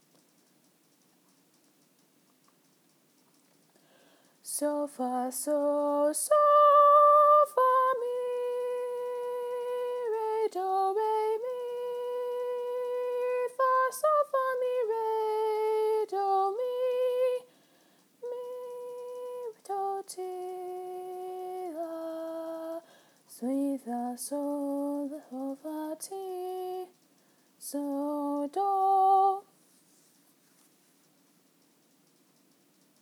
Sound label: alto